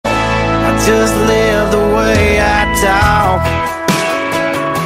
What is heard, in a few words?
Country